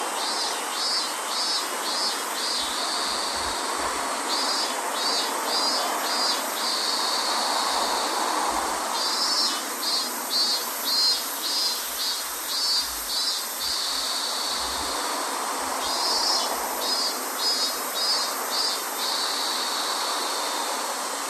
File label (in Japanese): road minmin